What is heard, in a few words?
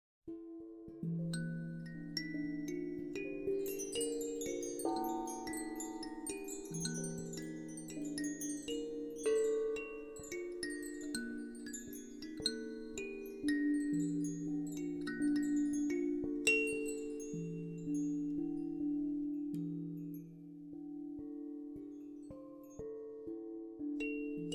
crystal cave explore day; Gothic 3